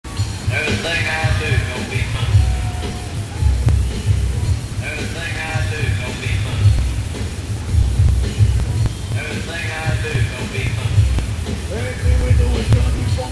Funky and Raw Fill; Live @ Arrow Hall, Warehouse, Toronto, Canada (26.05.1997)

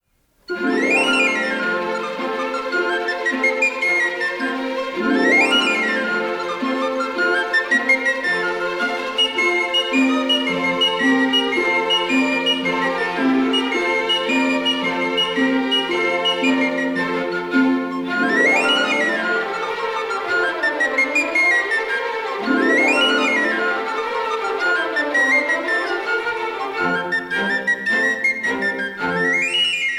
Pennywhistle Jig; Traître sur commande

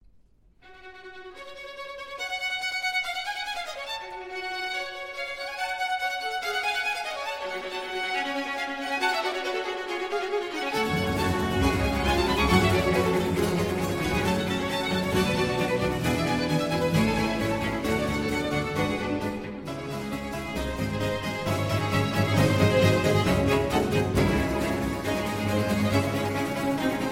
Concerto for Strings in G Minor, Allegro; Vivaldi; Concerti per archi III e concerti per viola d'amore

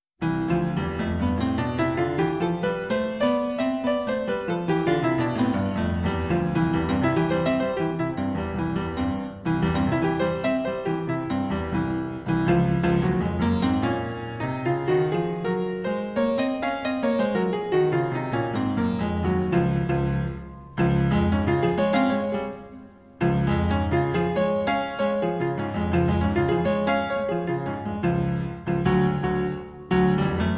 Piano Practice

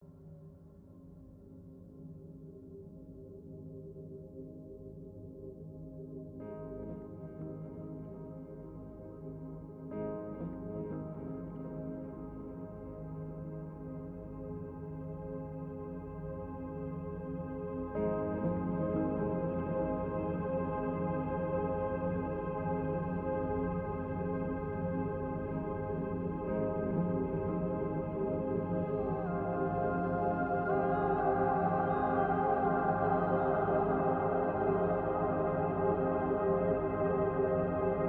Sleep Soundscape; Endel

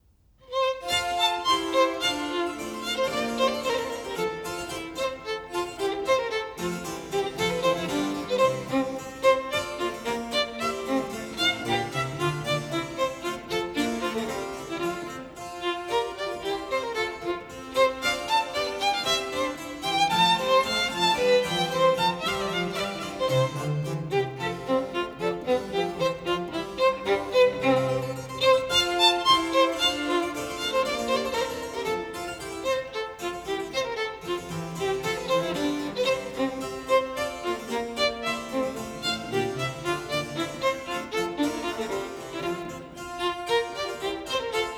Violin Sonata in F Major, Allemanda; Corelli; Arr Donington; Op. 5 No. 10; II. Allemanda (Allegro); The Menuhin Century; Yehudi Menuhin

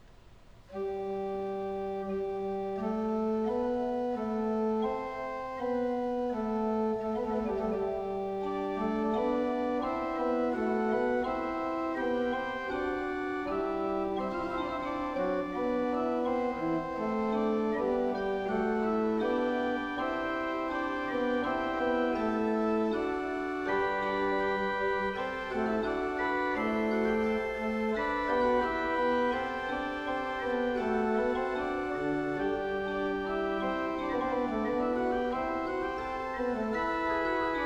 Choral Prelude, Organ; ''Wenn wir in hochsten Nothen sein''; Johann Pachelbel; Complete Organ Works

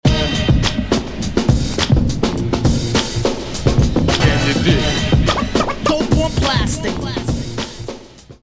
Beat

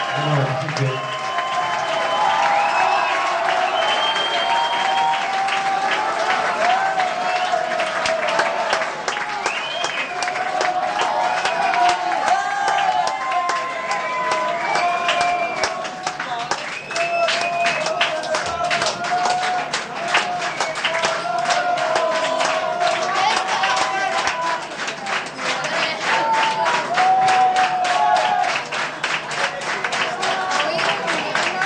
Crowd

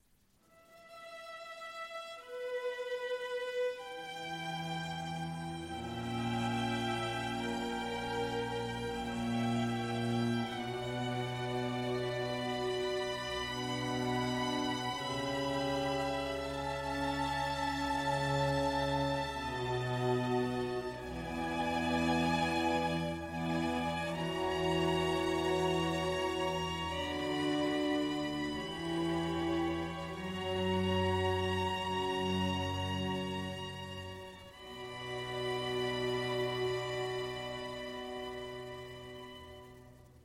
Concerto for 4 violins in E Minor, Adagio; Vivaldi; Op. 3 No. 4, RV 550; III. Adagio; Renato Fasano; Virtuosi di Roma

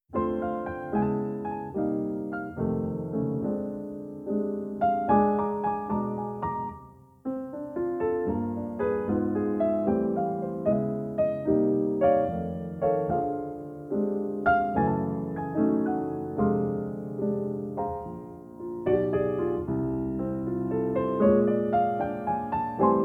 Safari Bar Piano Blues; 1962; Hatari (Original Motion Picture Soundtrack)

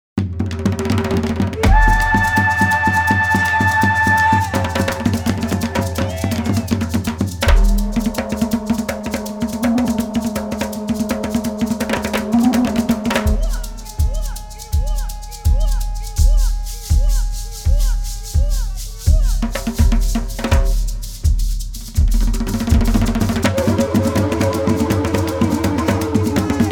Drum

